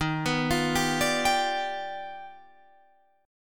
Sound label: Eb Augmented Major 9th Eb down Guitar Open D